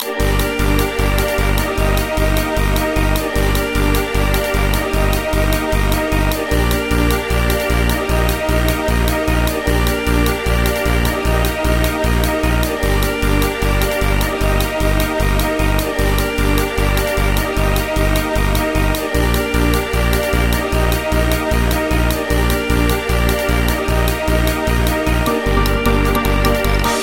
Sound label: Music